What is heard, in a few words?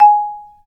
Bass Marimba